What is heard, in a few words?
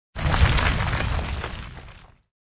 Level Complete; Blox Forever